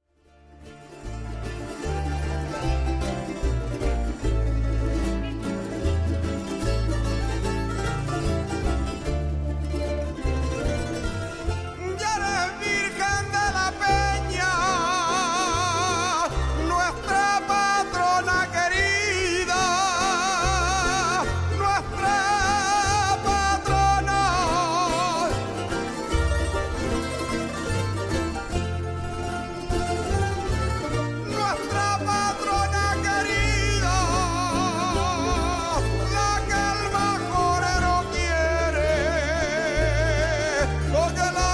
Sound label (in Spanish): Folias, Folclore